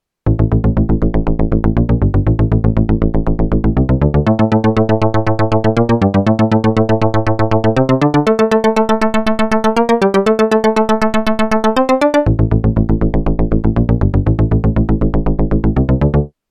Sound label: synth